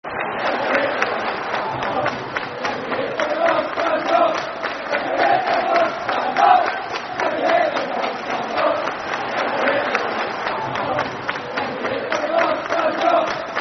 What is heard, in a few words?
Football Chant (Soccer Songs); Stand Up; Falkirk Ffc